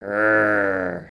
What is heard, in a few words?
Dragon Emote